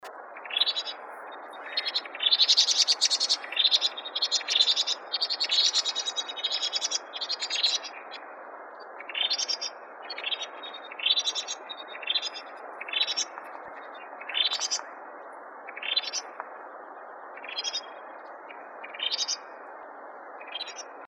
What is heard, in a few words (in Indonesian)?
suara huang teng call